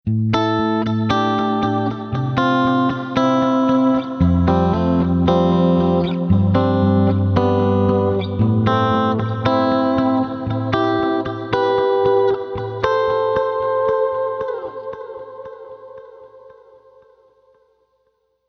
Fender Strat Rocktron Sacred Fire Boss DD20 Modulate Roland JC120